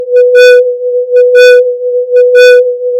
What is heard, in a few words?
filtered noise and signal